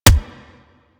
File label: Weapon Punch Shotgun